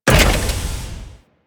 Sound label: Corpus Powerplant Jettison Door Start; Ambience; Warframe; Corpus Railjack; Tether Power Plant